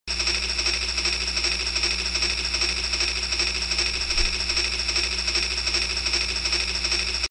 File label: chuff, train